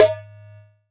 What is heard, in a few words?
Doholla Tak, Roland BK-5 Percussion